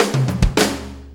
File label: 4-4 Fill, Drum Loops; Sonic Foundry (Sony Creative Software); Drum Tools; Fills